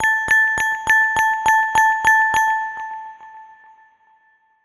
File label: Chirping Beat, ringtones; Samsung; Galaxy A56